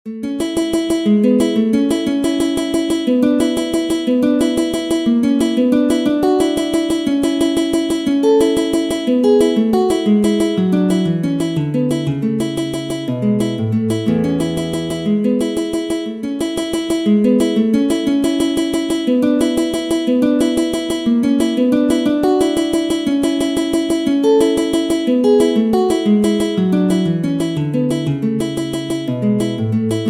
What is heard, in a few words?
Andante, Classical guitar; Matteo Carcassi; Op. 60 Vingt cinq études mélodiques et progressives; No. 13 Andante grazioso